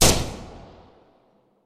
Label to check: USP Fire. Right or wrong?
Right